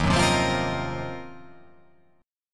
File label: D sharp Minor 6th Add 9th D sharp, chord